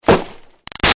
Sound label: Foley Box Hit Xmas Present; Crinkle; Big Room Sound